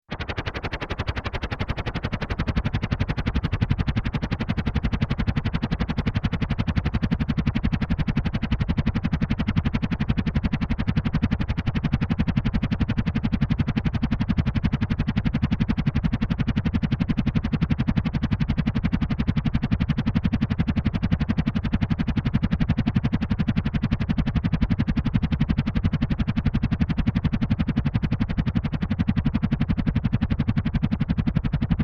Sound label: Helicopter SciFi Futuristic, Helicopter Interior Futuristic High-Tech Elements; Choppers; Sound Design